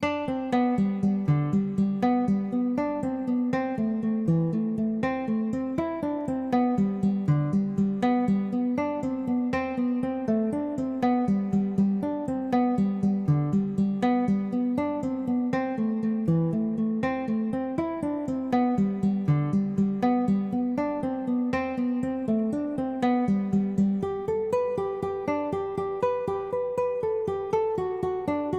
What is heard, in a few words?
The Irish Washerwoman fast, dadgad